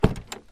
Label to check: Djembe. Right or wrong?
Wrong